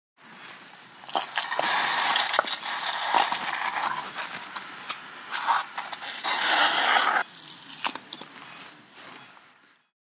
HOSPITAL EQUIPMENT HOSPITAL: Operating room, irrigating wound, suction.